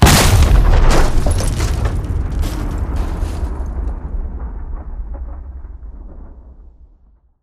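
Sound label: Reaper Nuke Destruct Explosion Close; Titanfall 2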